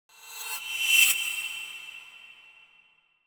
Whoosh Metallic Thin Attention Grabber; Hollywood Edge; sound designer's toolkit v2

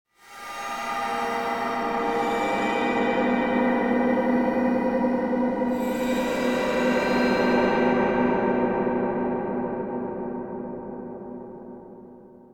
Drone Eerie Metallic World Pad Short; Hollywood Edge; sound designer's toolkit v2

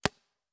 Paintball Gun; Sound Ideas; Guns

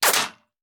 Bullet Impact; Sound Ideas; Guns

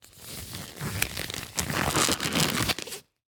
Latex Glove; Blastwave

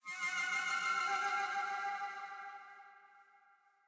menu post activate sax; Pitch Black - A Detective's Demise

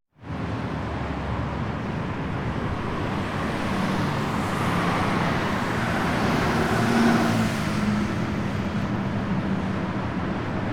Traffic General Atmosphere Highway; KMP Film Video Sound Effects

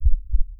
Heart Beat Fast; DeadFright

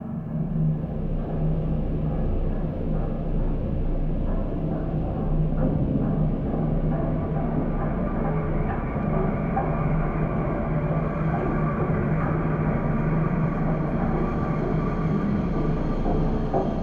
Lonely Manor entrance Hall

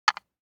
DICE on Wooden Tray, Drop, Standard, 4 Four Dice; Samples; ArticulatedSounds; DICE Sfx Party (Extended)

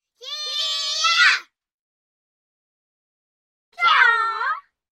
Vocals (Kids); Hollywood Edge; Martial Arts and Human Impacts

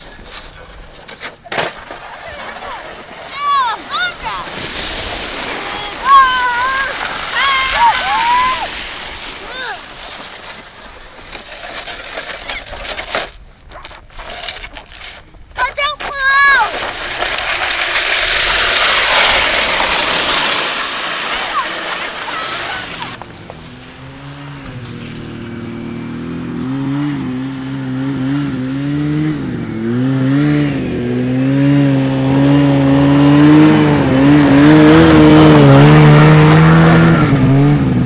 slight riding skidoos; best service Blue Box; sports

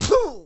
Boxing Male Spit Mouthguard

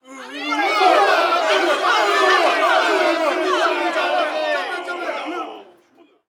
Street Ball Crowd Boo; SFX Kit; Crowds

